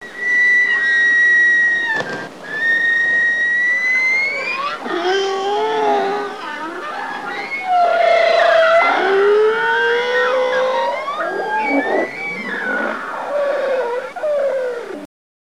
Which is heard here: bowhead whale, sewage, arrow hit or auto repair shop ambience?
bowhead whale